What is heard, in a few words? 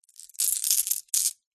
gemstones in small pouch tip out; zapsplat